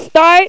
start

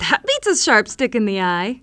THAT BEAT

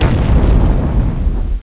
STRIKE

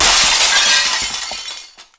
GLASS

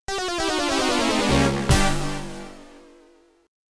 Game Over; Fuzzy's World of Miniature Space Golf

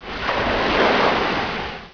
wave, water

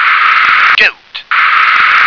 goat

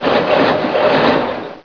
gears

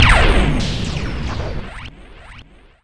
EXPL BAS

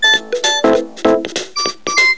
TIPTUNE

